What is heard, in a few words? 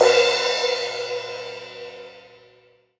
Sabian AA 16 Crash; BLACK PEARL